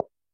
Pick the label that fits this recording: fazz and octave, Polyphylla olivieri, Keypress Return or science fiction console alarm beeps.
Keypress Return